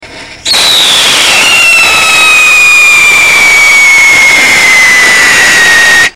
sodium benzoate plain, rockets, whistle tests; No Catalyst & No Mineral Oil; Core

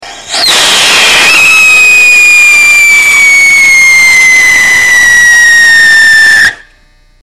zinc oxide sodium benzoate, rockets whistle tests; Core Burner; Speed similar to no catalyst

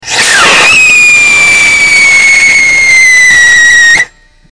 copper oxide core sodium benzoate, whistle; rockets whistle tests

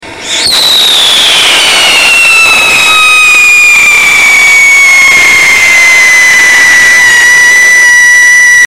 bismuth triox benzoate, rockets whistle tests

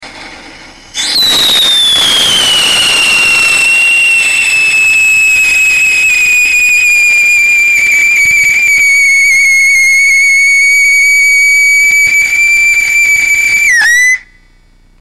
lead with core, rockets whistle tests; Lead Tetraoxide; Sodium Salicylate; Core Burner; Poisonous Mix - Use protection. Speed similar to no catalyst.

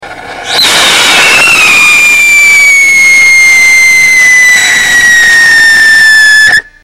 manganese sodium benzoate, rockets whistle tests; Manganese Dioxide; Core Burner; Speed similar to no catalyst

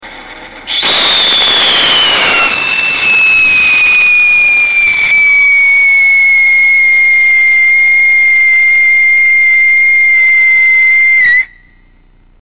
copper oxide core, rockets whistle tests; Copper Oxide (black); Sodium Salicylate; Core Burner; Speed similar to no catalyst

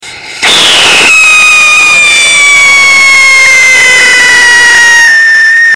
copper chromite, rockets, whistle tests; Potassium Hydrogen Phthalate; Core Burner; Sharp spikes.

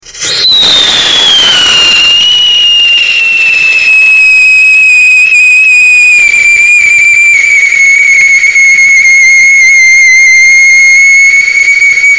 whistle test tio2 with core; Titanium Dioxide; Sodium Salicylate; Core Burner; Speed similar to no catalyst

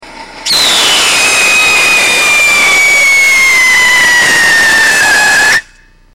copper chromite, rockets, whistle tests

